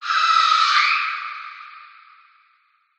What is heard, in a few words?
scream shriek descend sound design reverb end ascend sharp abrupt; skyclad sound